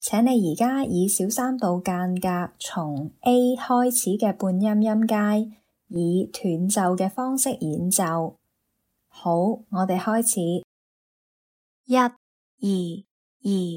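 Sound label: chromatic scale a minor third apart starting A in staccato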